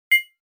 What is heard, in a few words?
Player Menu